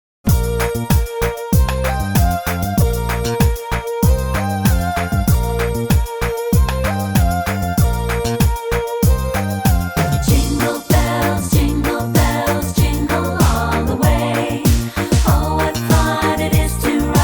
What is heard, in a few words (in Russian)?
Pesnya Jingle bells Dlya detej